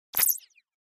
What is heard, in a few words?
Quick Laser Release, lasers